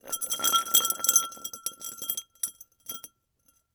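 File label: Glass Bottle Spin On Cement; Foley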